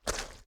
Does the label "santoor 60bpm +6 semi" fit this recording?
No